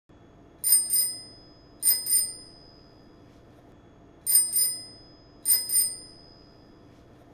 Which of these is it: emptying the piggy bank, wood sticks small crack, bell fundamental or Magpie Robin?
bell fundamental